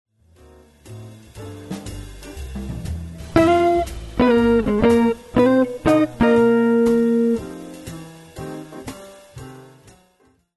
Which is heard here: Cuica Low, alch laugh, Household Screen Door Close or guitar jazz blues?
guitar jazz blues